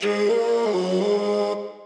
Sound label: phrase Abm, Vocal; Slate Digital Omnivox Vocal Sample Pack